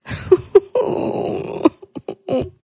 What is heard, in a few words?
human FEMALE sustained cry sob